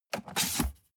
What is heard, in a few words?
cardboard box open unfold scrape short; objekte